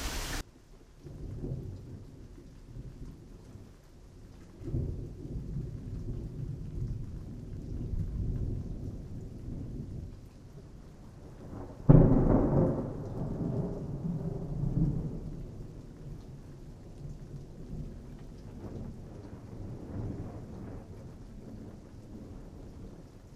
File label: Recording Thunderstorm (no rain)